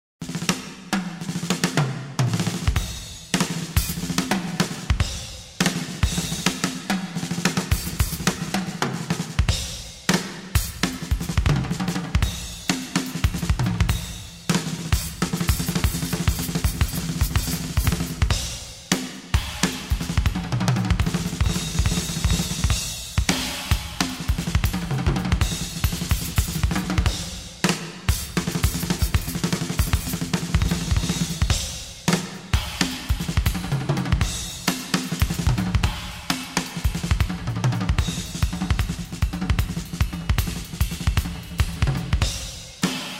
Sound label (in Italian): assolo